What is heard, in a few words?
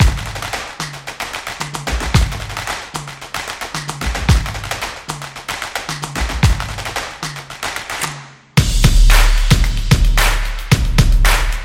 The Drums Vlog Opener